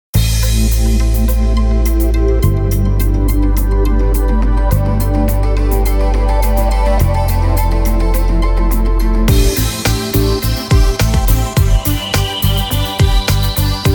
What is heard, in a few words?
abstract lounge